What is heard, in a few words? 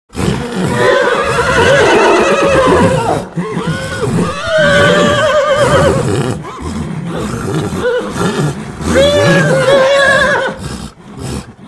horse grunting heavy raspy breathing